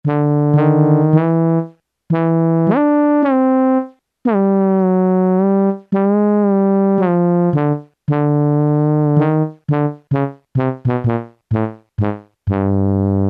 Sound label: TROMBONE, Yamaha SHS-10